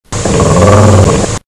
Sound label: grrrr